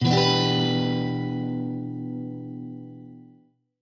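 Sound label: E min, Guitar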